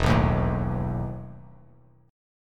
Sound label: E Major 7th Suspended 4th E; strummed; JGuitar